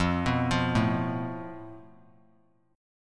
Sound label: F Major Flat 5th; FMb5 arpeggiated; JGuitar